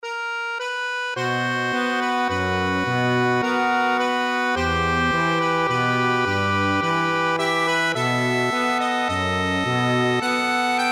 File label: accordion